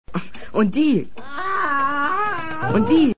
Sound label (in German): Schrei